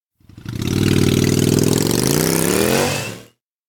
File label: bike hard start; anemoi